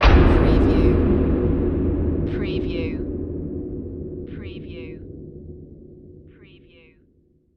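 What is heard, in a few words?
Production Element Hit Dark; Sound Effects Bible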